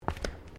FOOTSTEPS THONGS (FLIPFLOPS) TILES STEP; Footstep Foley; Human

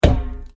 RUBBER TYRE STRIKE; Material components for constructing more complex sounds; Objects

